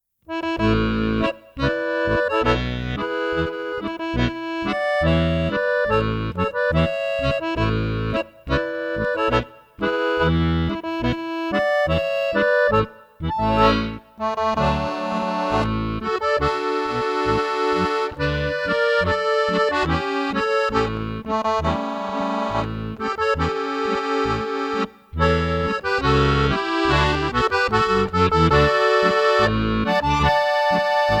Volksmusik

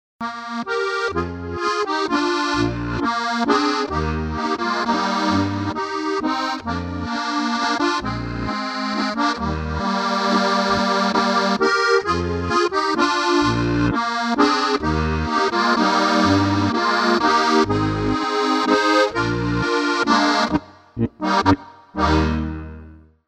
Harmonika schnell Walzer

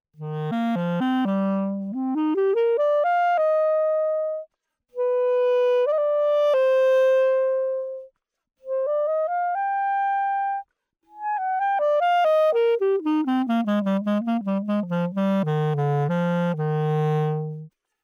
pickup klarinette; Einbau-Tonabnehmer für Holzblasinstrumente; Sounddemo

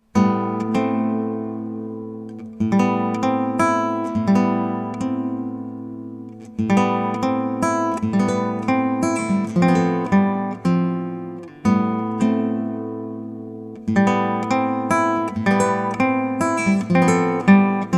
Konzertgitarre Easypick Klassik ohne EQ Mono

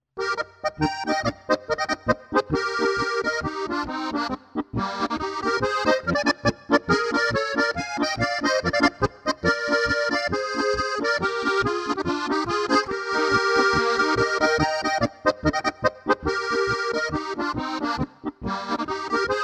Harmonika schnell Polka

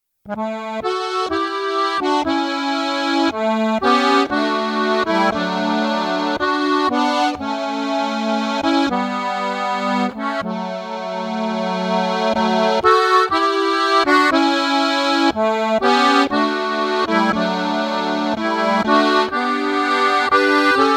Harmonika TA3000eco flach Walzer

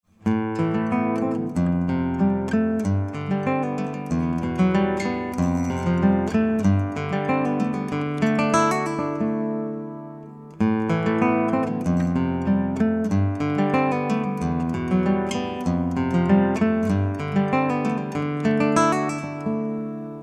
Konzertgitarre Easypick Pop ohne EQ Mono

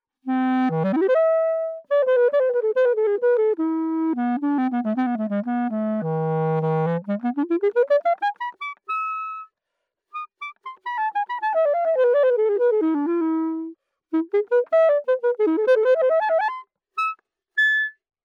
Klari lo bass

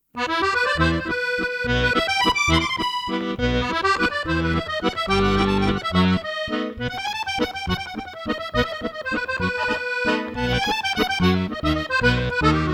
Musette

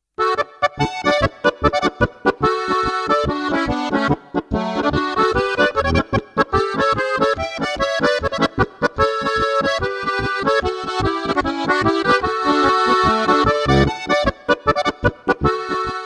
Harmonika TA3000X flach Polka

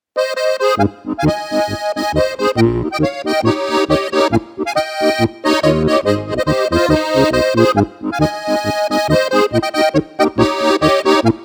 steirische harmonika tremolo schnell polka; TA20X; mikrofone akkordeon steirische harmonika; Rumberger

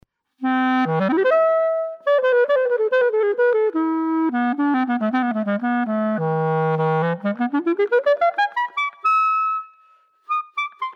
Klari

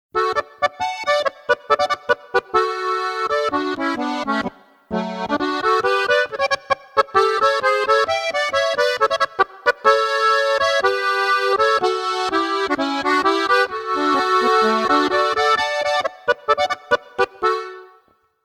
Harmonika TA3000eco flach Polka

